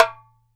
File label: DARABKA, Mediteranean Traditions percs